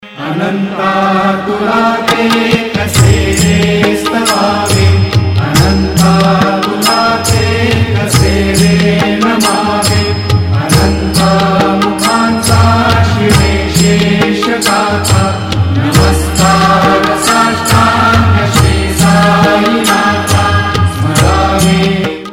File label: ringtone